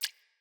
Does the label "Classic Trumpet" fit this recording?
No